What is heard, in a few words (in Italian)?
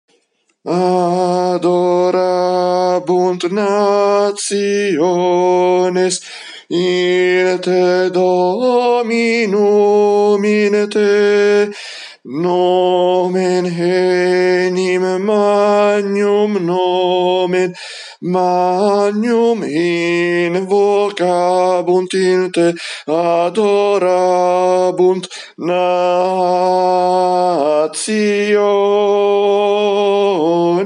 tenori; Adorabunt